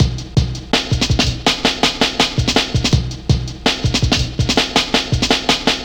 164 Insects, breaks; m8 breaks

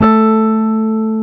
Jazz Guitar

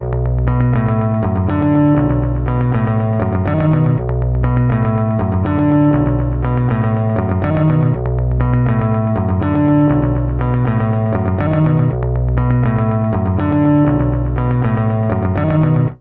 Bass Guitar Loops; FM 57 - The Ultimate Sample Collection (1997)

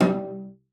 G3, CELLO FINGER; Xsample VOL-5

